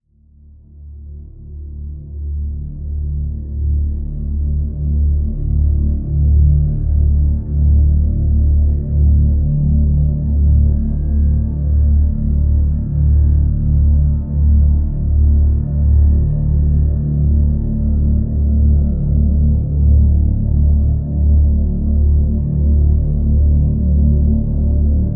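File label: drone D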